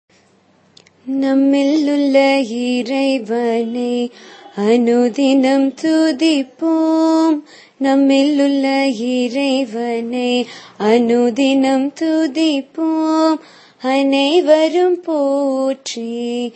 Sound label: Prayer song